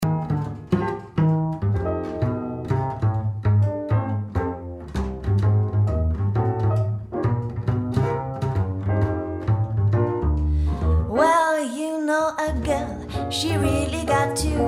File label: trio old style swing